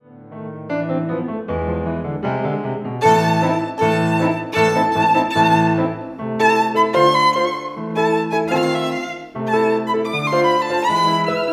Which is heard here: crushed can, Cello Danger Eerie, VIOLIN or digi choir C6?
VIOLIN